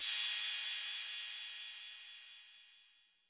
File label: urban trap ride; Urban Trap Kit; Hip Hop; STYLE KITS (Hip Hop, Etc)